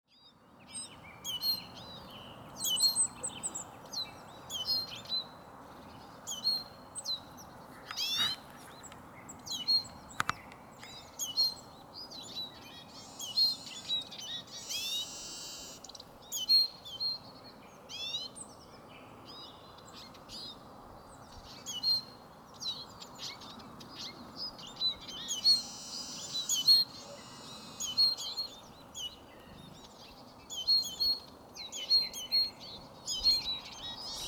vihervarp kutsu; linnut

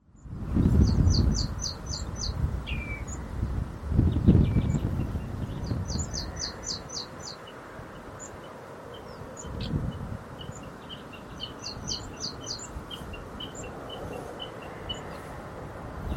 niittykirvinen; linnut

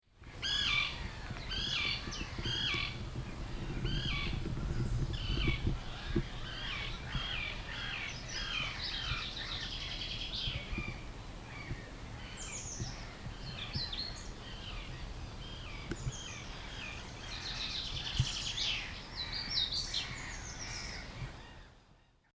tii-ra; linnut

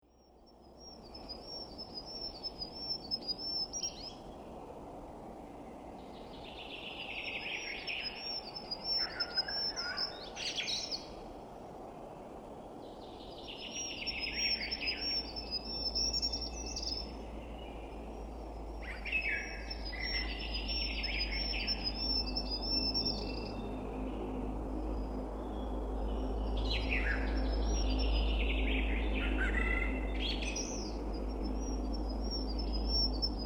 hippiainen; linnut

